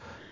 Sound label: techno